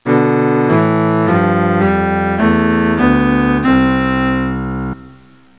Cello; Music Game

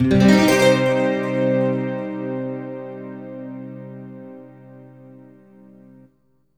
ELECGUIT, AMBNT, 160, C; Ambient; Guitar

